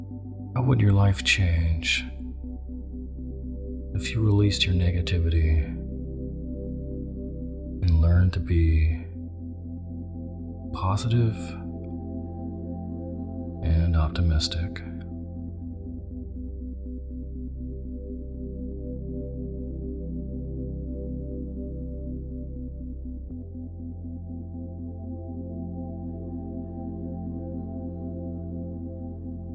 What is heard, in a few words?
Isochronic Tones; positive energy 1.0